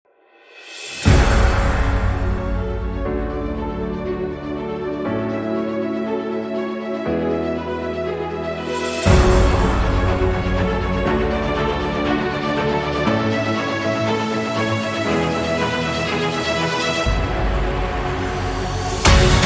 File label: Epic Cinematic Background